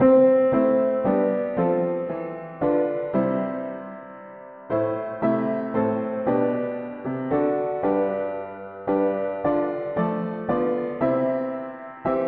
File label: mono piano; O Dieu nous sommes tiens; hymns